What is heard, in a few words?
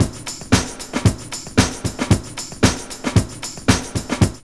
DISCO LOOP; Zero-G; Funky Elements